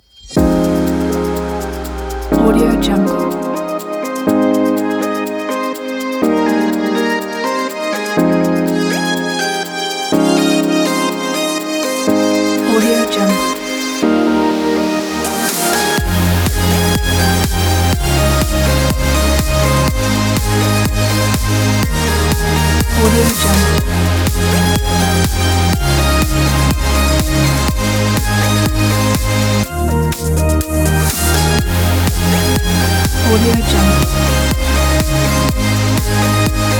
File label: edm house